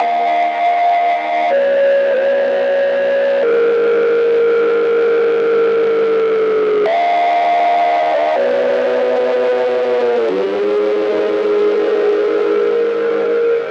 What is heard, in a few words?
detuned lead